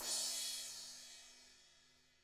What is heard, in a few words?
BRUSH CYM; Drums; ILIO; Double Platinum Drums 1